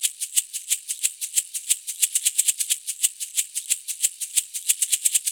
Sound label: ETHNO PERCUSSION 90; ethno2